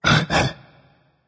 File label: disagree berserker; cuchulainn